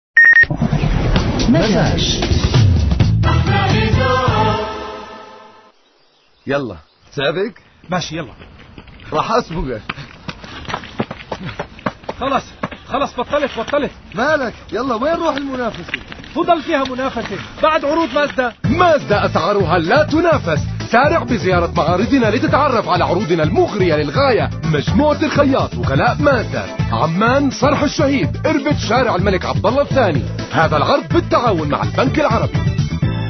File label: interval signal; mazajfm